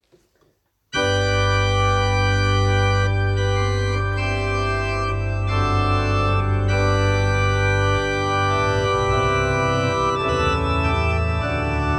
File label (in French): Grand Plein Jeu; Gaspard Corrette